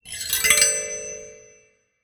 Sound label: Finger Gesture, Finger Prepared Kit; Battery 3 Sample Kits